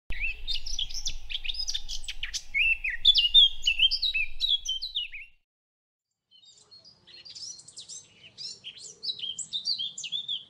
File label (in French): chant fauvette a tete noire sylvia atricapilla